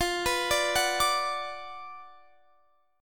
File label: Gb Major 7th Suspended 4th Sharp 5th F, chord